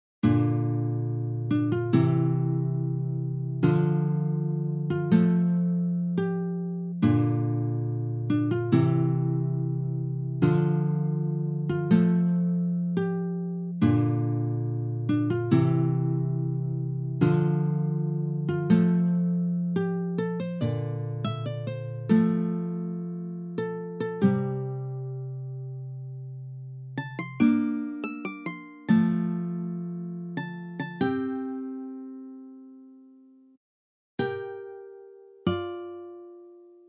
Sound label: Guitar; Level 7